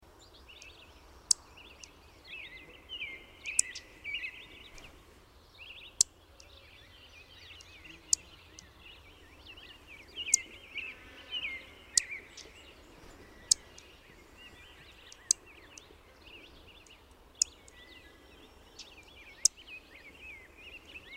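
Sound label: Savannah Sparrow Chip Notes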